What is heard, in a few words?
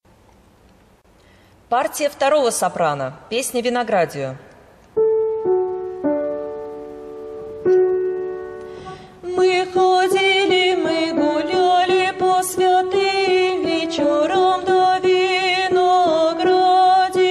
soprano; Vinogradie